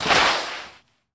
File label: Baseball Slide Dirt